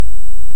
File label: Hydrophone; OneOcean